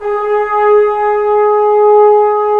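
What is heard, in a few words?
brass trombones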